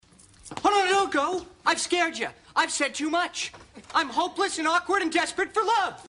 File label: Acoustic